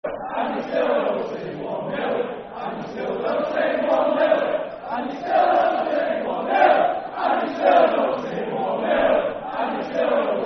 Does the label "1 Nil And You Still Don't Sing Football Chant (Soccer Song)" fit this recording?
Yes